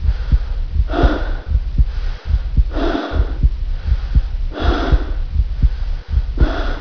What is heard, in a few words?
arrest, ambience